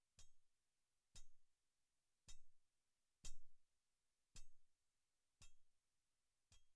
Metal Grate Floor Hit Pickaxe Hammer Thingy Great Hall